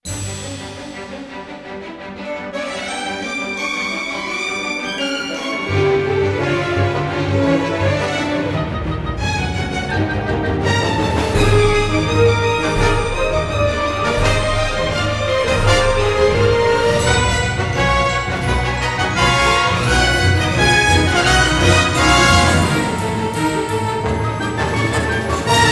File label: No Choir, Orchestra